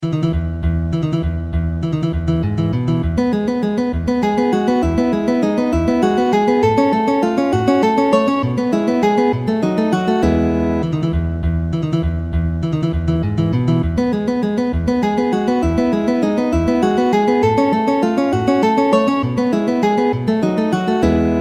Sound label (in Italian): valses, guitar